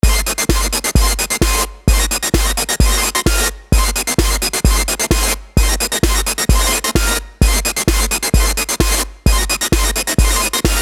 noisy garbage

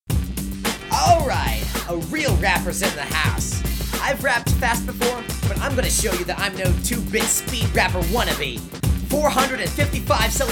Rap; Pony Rap Brony

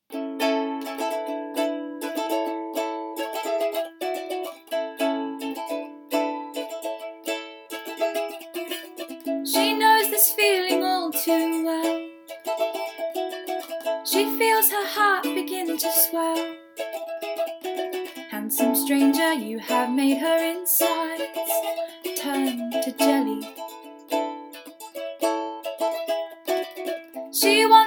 [cover][singing][ukulele]

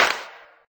Sex Smack

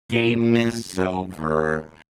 Vocals Demonic; 140BPM; Pony Fan Music Remix Stems

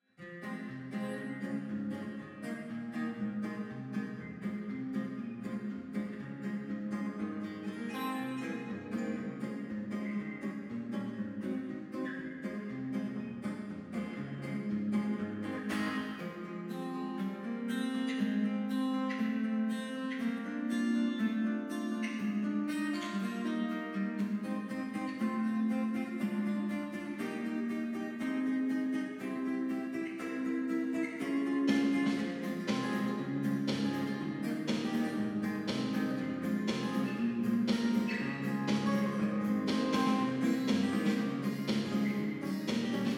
reverb, 120BPM

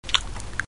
Suck; Lewd SFX

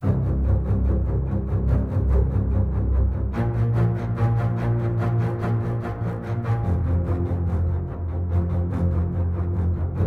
strings, 145BPM

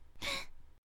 Breath; Lewd SFX

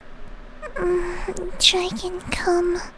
Moaning; Dragon cum...; Fluttershy

